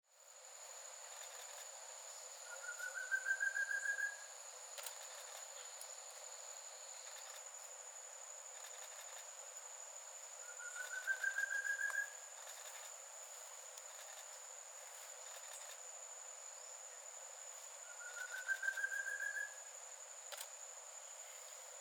Thicket Antpitta 8 songs

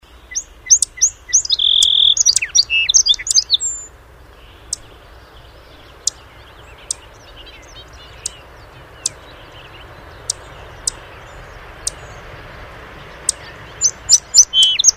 Song Sparrow

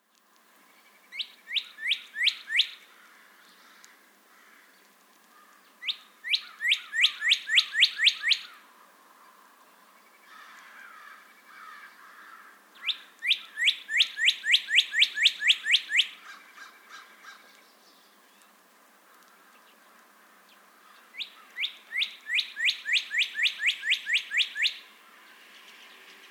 Cardinal entire singing bout

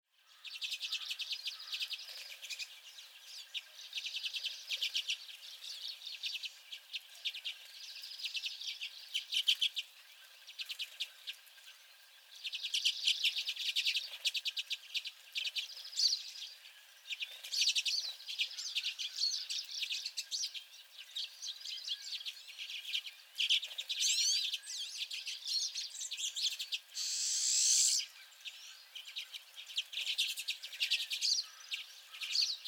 Common Redpolls and at least one Pine Siskin

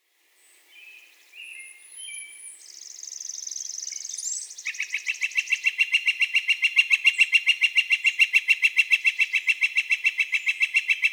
Northern Flicker long calls

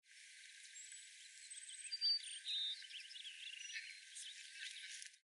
Cassins Sparrow Distant

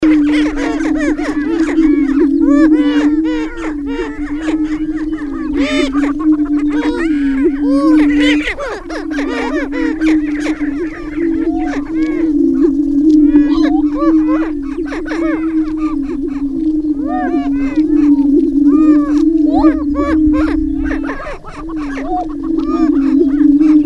Greater Prairie Chicken Booming

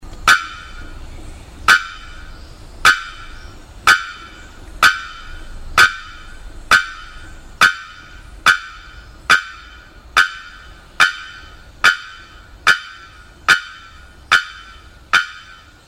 Bearded Bellbird Rapid Bonks

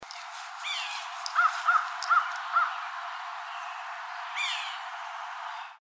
YBSA Mew

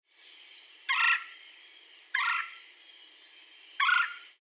Red bellied Woodpecker calls

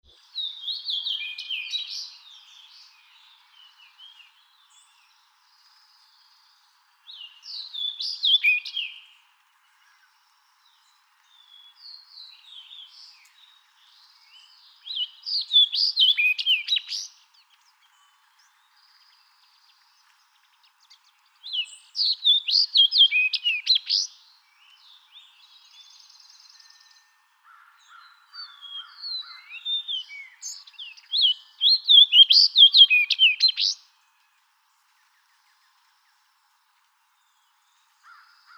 Fox Sparrow

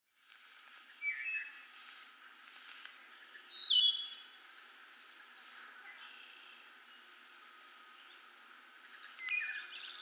Wood Thrush songs; 11 songs edited

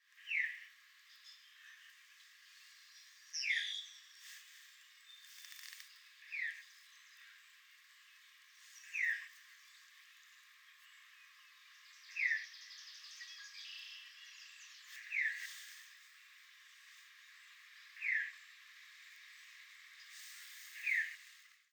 Veery 8 Call Notes

